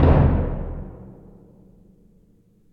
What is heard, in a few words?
B Drum